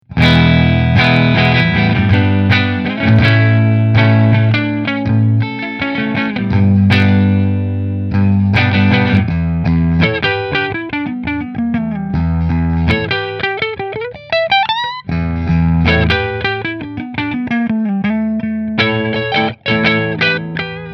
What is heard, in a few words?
Mcnaught Neck Fender, guitars; McNaught Custom Guitars Vintage Single Cut Dark Blue